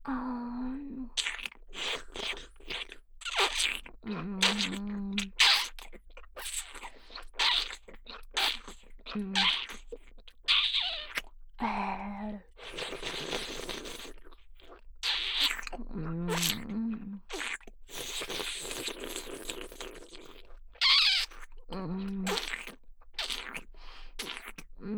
フェラループ

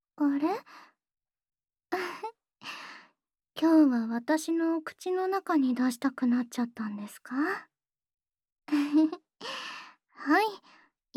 オナサポ用フェラチオループ

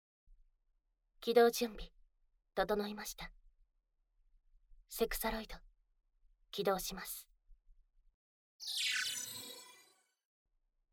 両耳舐め; 両耳舐めオナニーサポート01

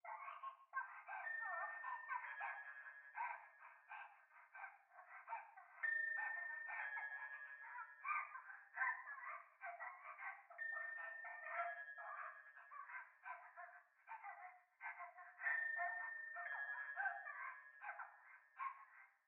トイレ 可愛い喘ぎ 神社

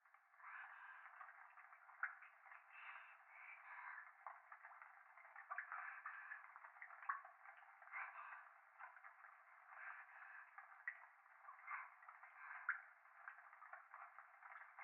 風呂 左耳密着処女喘ぎ 雨上がり

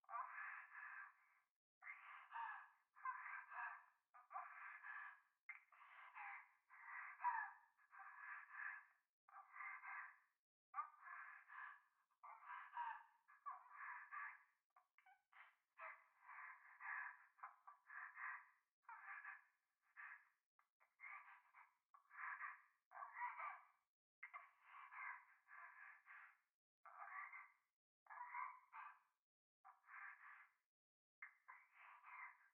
風呂 右耳密着処女喘ぎ

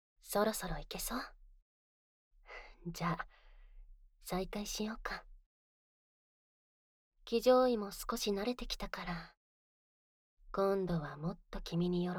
添い寝で濃厚耳舐め; セックスSE無し

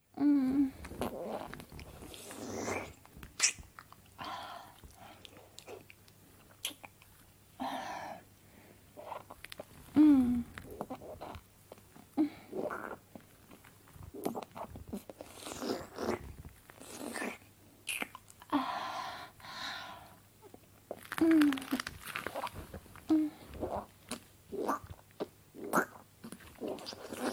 人妻がチンポをしゃぶりつくす! ガチのフェラチオボイス

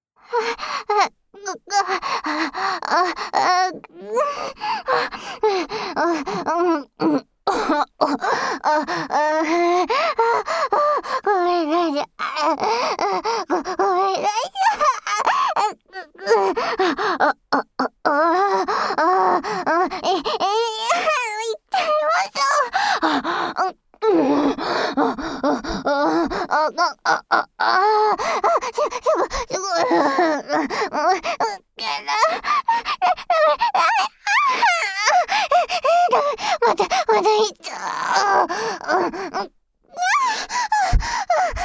完全敗北謝罪アクメループトラック, ASMR

